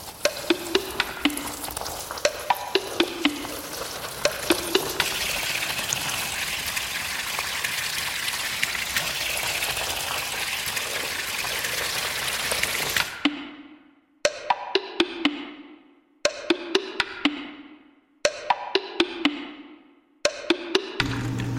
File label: Environment 3D Stereoscopic Environment; MIUI12